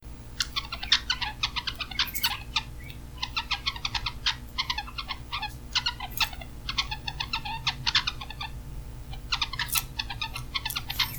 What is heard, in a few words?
bronze winged mannikin song